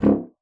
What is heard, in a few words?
C#1, bassoon; Xsample VOL-6